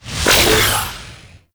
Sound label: Orbital Strike Ability Cast Throw; Warframe; Trapper